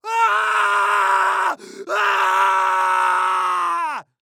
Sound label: Human Scream; MinorHallutinationsSounds1